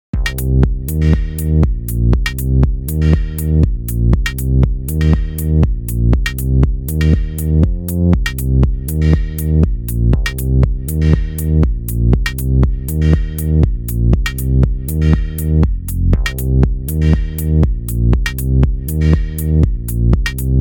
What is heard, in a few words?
house; jno i-house